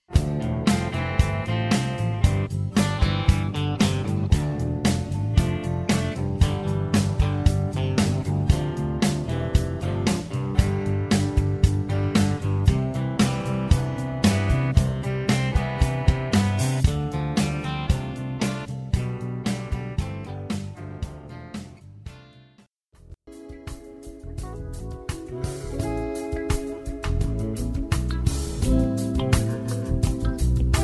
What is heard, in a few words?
Backing Tracks Examples